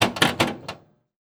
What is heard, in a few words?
Electronic Pinball Machine Buttons; AdobeCC